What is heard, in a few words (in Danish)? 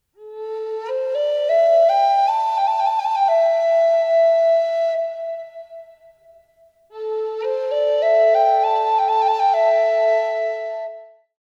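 fløjte; julie ben semmane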